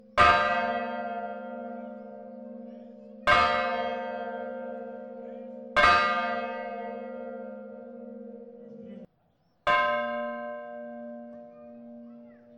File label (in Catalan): campana